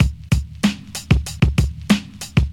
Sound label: 95 bpm 2000s hip hop drum groove c sharp key, kick tuned to the C# note